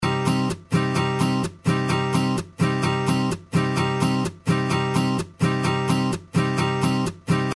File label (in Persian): ریتم گیتار آکوستیک